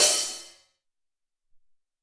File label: OpHat, Alesis SR-18, drums